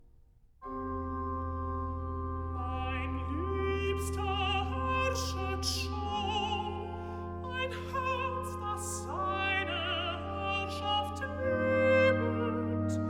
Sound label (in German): Recitative, alto; Mein Liebster herrschet schon